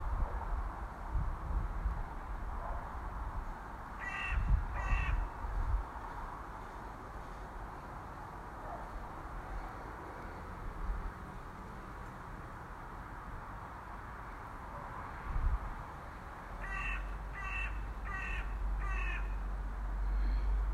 The Carrion Crow

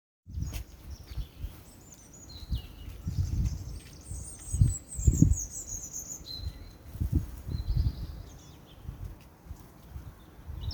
Bird Song at night

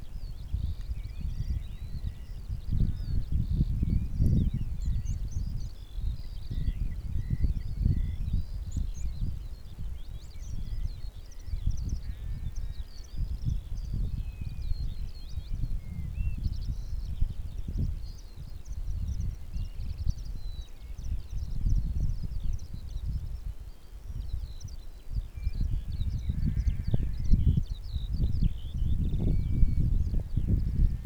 Farmland; Forsinain

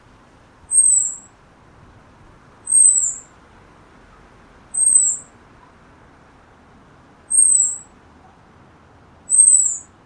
Unknown bird

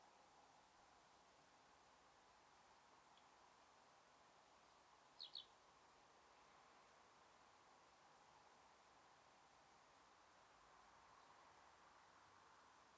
Bird

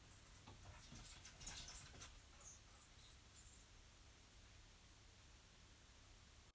Chimney recording

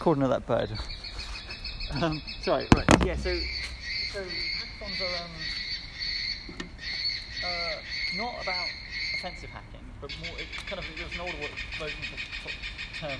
University Offices, Wellington Square

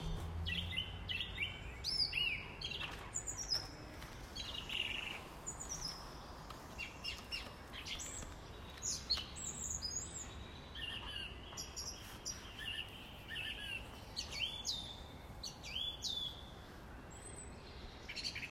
Bird song, Darlington

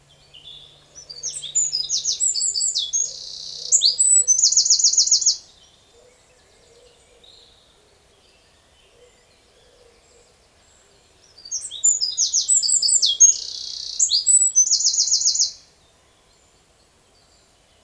Wren

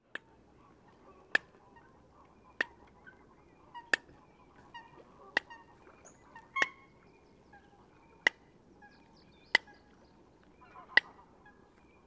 Eurasian Coot Fulica atra

